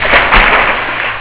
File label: pool splash; Motorola MPX200